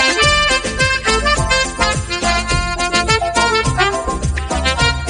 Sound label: accordion sax